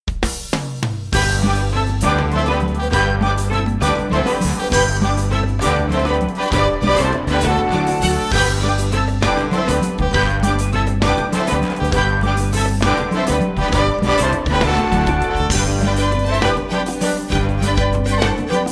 Exit Music; lionking

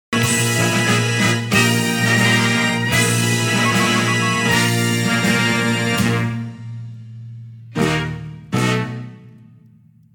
backing track

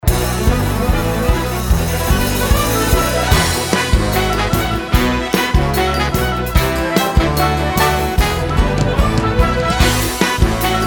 Exit Music; finding nemo

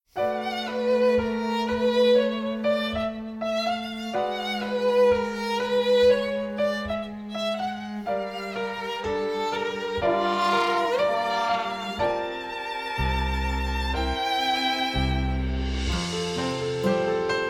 Lunch Box Underscore

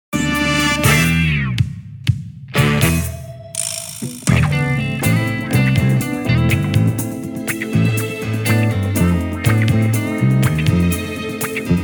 backing track

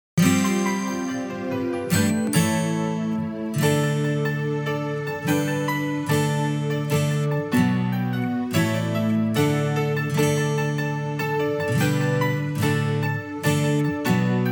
backing track

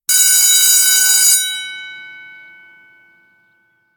School Bell

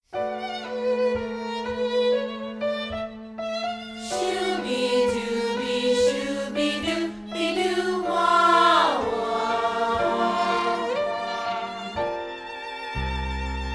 Lunch Box Underscore

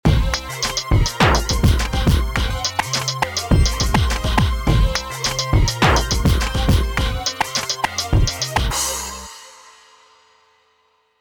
After Shark Rap Instrumental; descendants

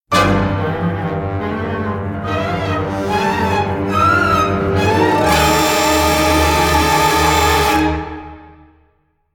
Scurry Music

